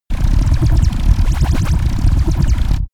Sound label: space laser blaster cannon damped; krieg-waffen; soundpool